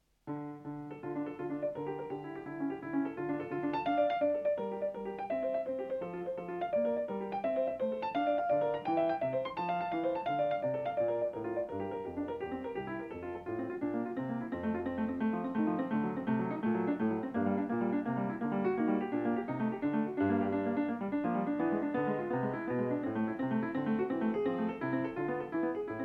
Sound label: Prelude in D minor; Prelude No. 6; BWV 851